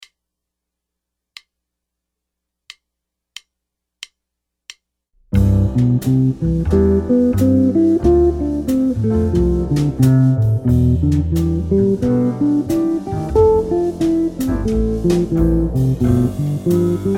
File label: arpeggios, guitar